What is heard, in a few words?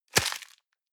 plastic bag small grab hard